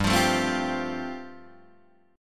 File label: G 7th Suspended 2nd Suspended 4th, Guitar DADGAD